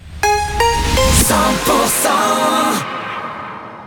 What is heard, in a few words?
jingle